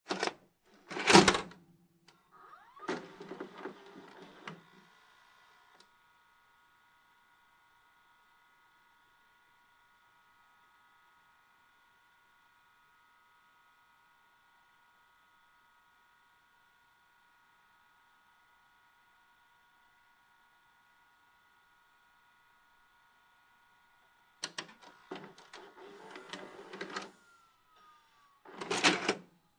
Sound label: VCR VHS Tape Insert Play And Eject, Technology, VCR, VHS Tape, Insert Tape, Play, Eject Tape; Entertainment System; Entertainment Systems